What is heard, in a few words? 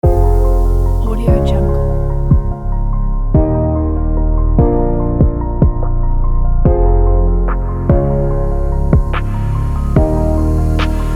science background